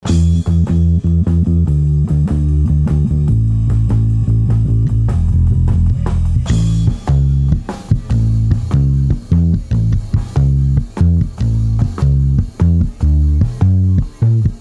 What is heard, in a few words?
Bass Amp Bounce, Listen to the Bass Amp, Note the drum leakage into the Bass Amp mic